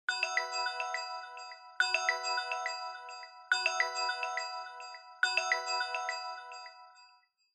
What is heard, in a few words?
Smartphone Ringtone Speaker